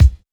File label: raw steel kick drum sample c sharp key